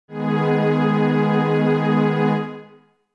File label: Ab Ab Minor